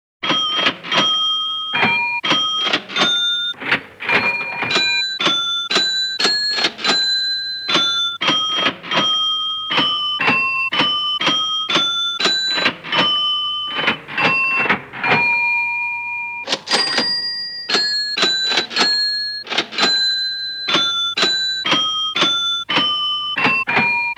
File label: Christmas Commercial